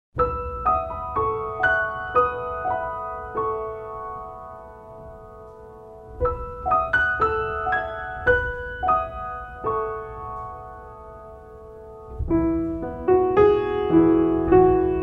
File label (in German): Musik Piano; deutsche doku sprecher